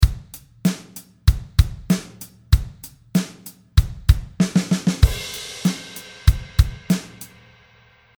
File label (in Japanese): タカタカ